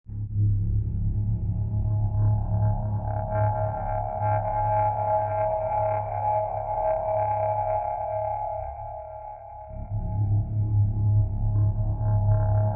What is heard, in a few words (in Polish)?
bass on synt, Pady; Muzyka Ilustracyjna